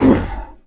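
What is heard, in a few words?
zombie damage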